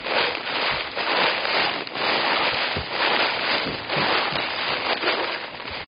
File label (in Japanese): ザッザッザッザ・・・, 生活音; 生活音系効果音